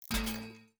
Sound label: Windows Critical Stop